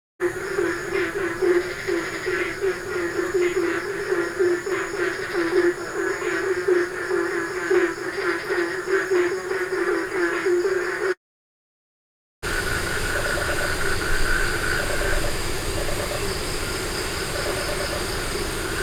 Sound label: Gamelan; Propeller Island - Complete Gamelan